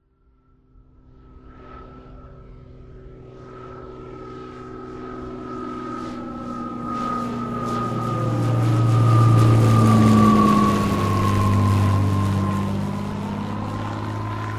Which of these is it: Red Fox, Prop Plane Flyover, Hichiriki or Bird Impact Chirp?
Prop Plane Flyover